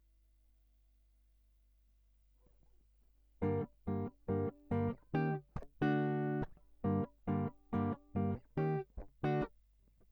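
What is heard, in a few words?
Guitar Line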